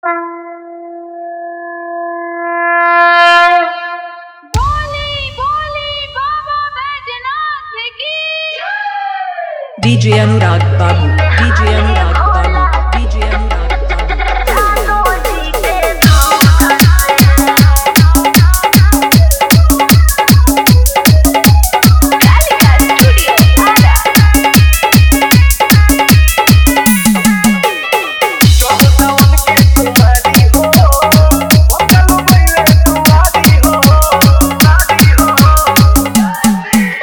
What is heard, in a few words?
Bol Bam Full Dhollki Bass Dance Mix